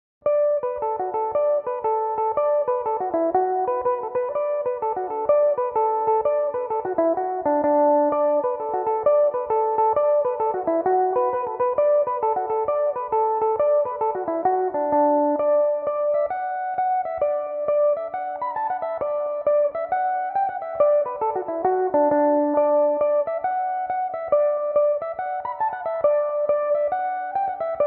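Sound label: D major belmuse, irish mandolin; The Gleanntan Frolics; Belmuse EM-190 electric mandolin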